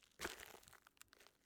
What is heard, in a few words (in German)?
Kies Schritt; Gods of Akragas